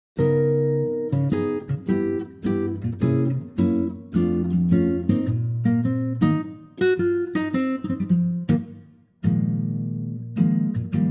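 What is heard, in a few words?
acoustic guitar Eb; key - Eb - vocal range - Bb to Eb; Stunning acoustic guitar arrangement; classic standard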